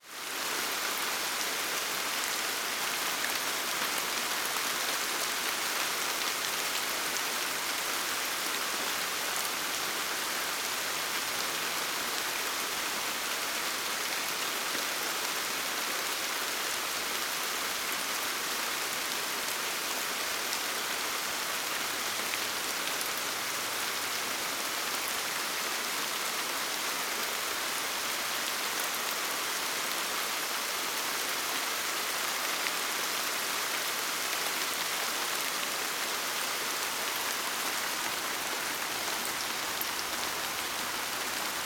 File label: rainy; bookworld